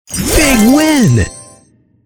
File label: big win